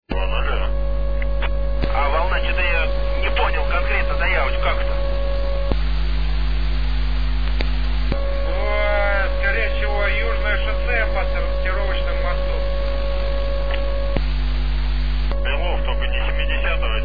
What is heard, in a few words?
signal